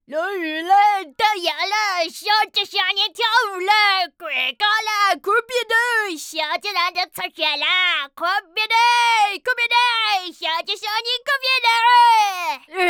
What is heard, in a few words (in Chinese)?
小男孩童谣